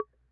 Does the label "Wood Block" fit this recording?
Yes